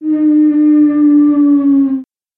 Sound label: monster whale